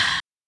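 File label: Ah, percussion; Polyend; Legowelt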